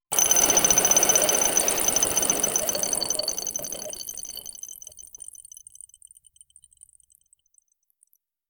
a quick playful one shot, bubble

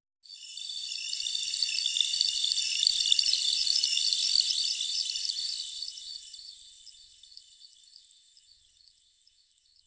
a whimsical sweet and flu

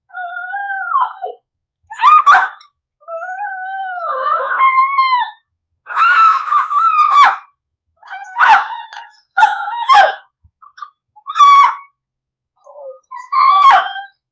female

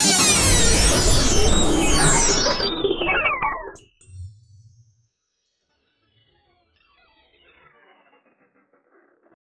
scifi glitch efefect

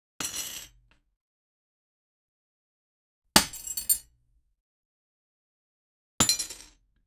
a piece of bread landing; generic

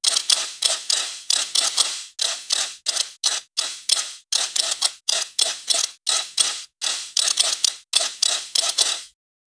robot walking like iron

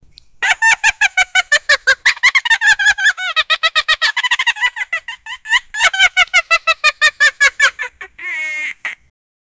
monkey laugh; ape

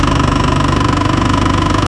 loopable, sports car; 2 seconds; a 2 seconds loopable mode

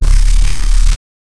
instant teleport sound, teleportation

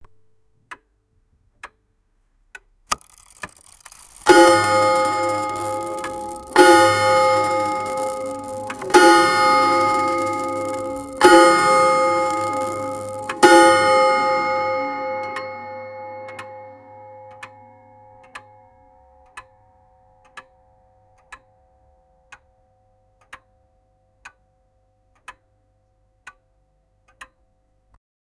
the ticking of an old, timekeeping

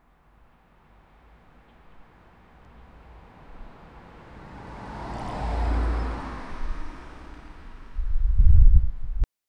peaceful ambience, neighborhood

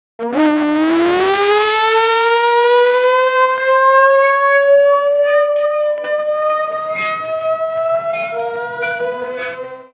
formula 1 race start ligh, motorsport

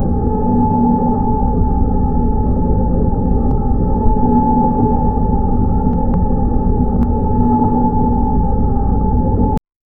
realistic submarine ambie

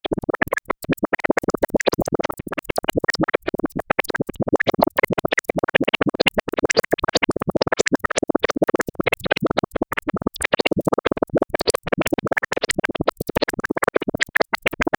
mega ai thinking, artificial intelligence

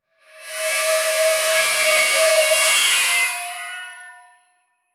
the katana unsheathes wit; melee

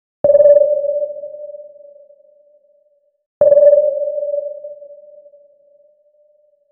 futuristic submarine ping

